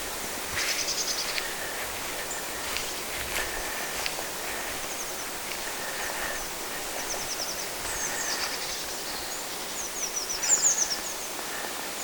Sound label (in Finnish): lintuja rehevassa kohdassa rannikolla